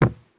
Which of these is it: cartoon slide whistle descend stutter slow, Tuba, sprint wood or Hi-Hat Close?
sprint wood